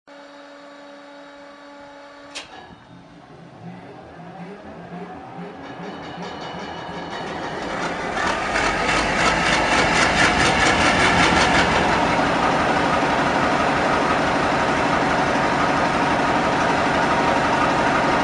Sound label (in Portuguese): Motor; Comboios